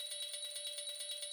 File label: count up loop; netent